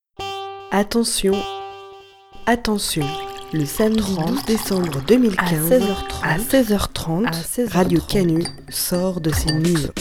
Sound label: jingle; gouter sonore